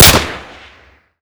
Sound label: usp45; Modern Warfare